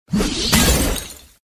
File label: skill bullet; drunkard